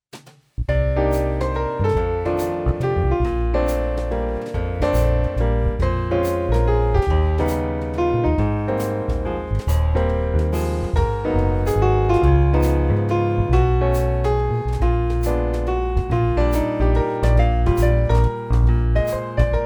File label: a Jazz Waltz